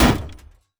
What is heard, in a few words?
Hit In, Damage; DCS